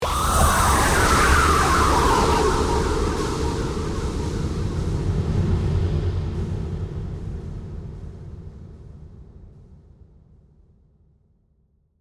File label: Horror Distortion, Horror, Distortion, Scream, Dark, Reverb; Production Element, Imaging Element, Accent, Transition; Scary Tone; BlastWave